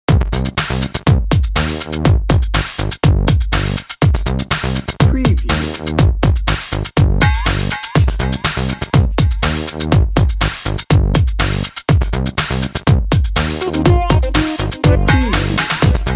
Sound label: bouncy and bright bed